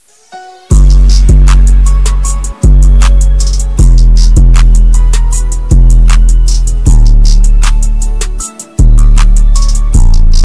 drip car

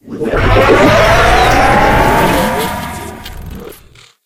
warp start

